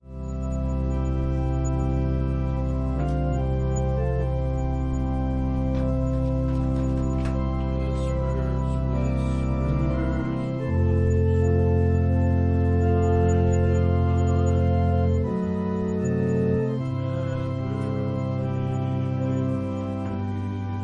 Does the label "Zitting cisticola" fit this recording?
No